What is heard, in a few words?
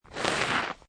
Snow And Ice Slide On Snow; Weather